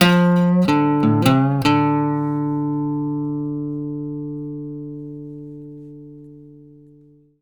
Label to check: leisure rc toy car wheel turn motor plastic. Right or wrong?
Wrong